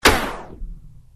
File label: stop, vinyl